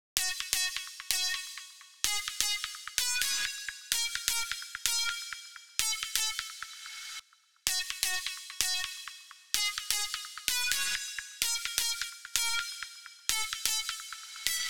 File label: 128 BPM F# Min Lead; House; Drop Loops; Cymatics; Mothership